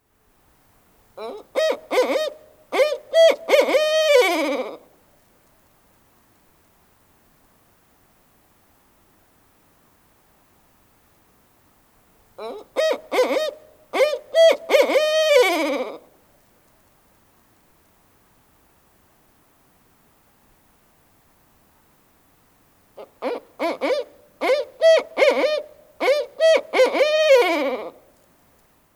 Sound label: barred owl sound